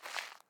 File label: Footstep Landing